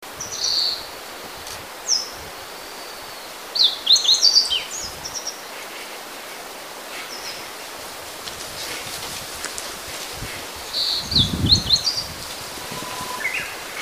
hojiro, birds

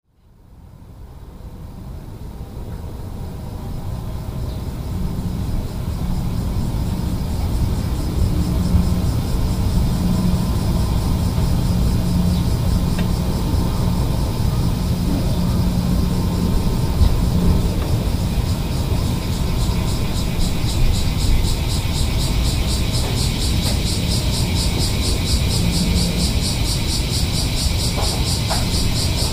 home, birds

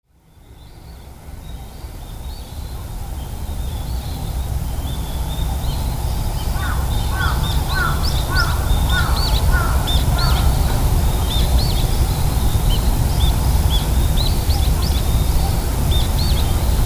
home, birds

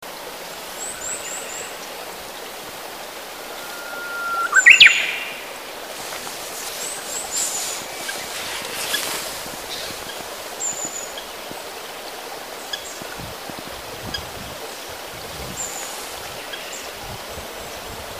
uguisu, birds

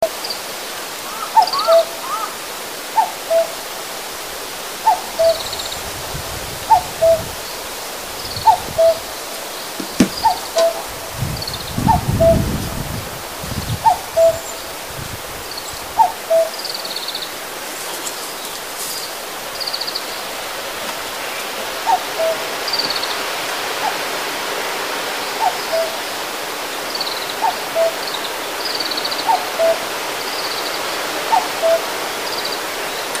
kakko, birds

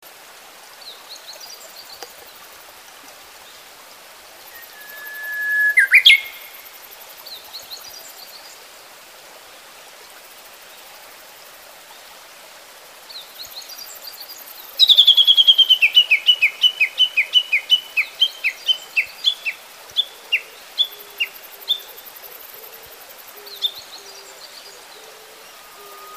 uguisu, birds

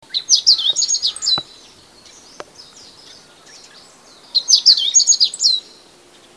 hojjiro, birds

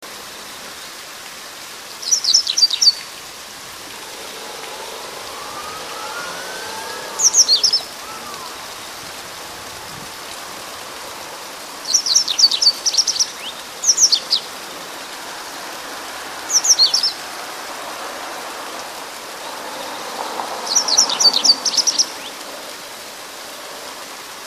segurosekirei, birds

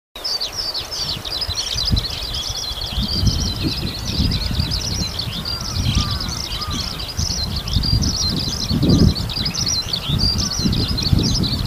hibari, birds

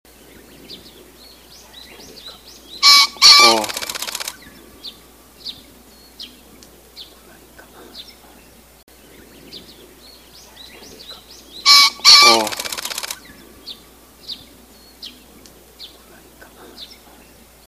kiji, birds

